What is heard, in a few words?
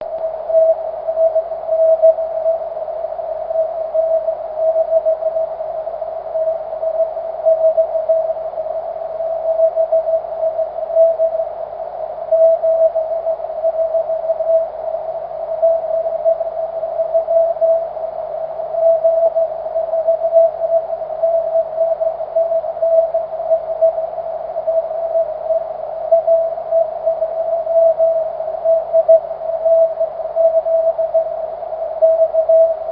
cw; 23cm